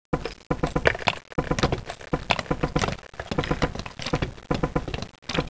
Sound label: plat move